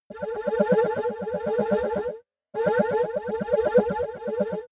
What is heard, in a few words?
abstract synth, energie